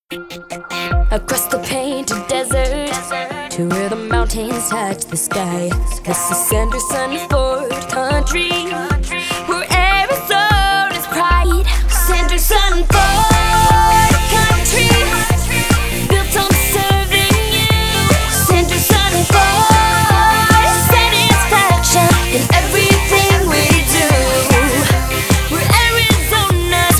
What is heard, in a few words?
Female Pop, auto dealer jingles; SandersonFord